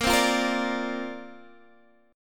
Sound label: C 7th Suspended 2nd Suspended 4th A sharp